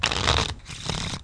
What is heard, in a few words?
SHUFFLE